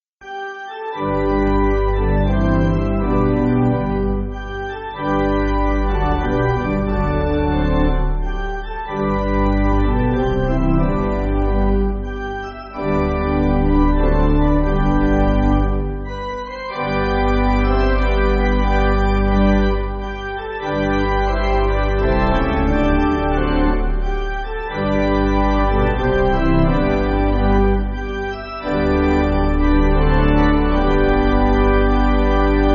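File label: Pipe Organ, Backing Files for Churches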